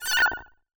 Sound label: checkpoint; big tower tiny square